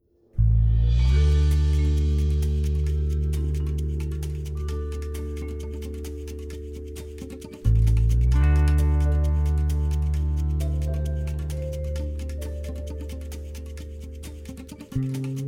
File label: Comedy Suspense